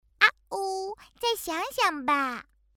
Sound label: error; luojigou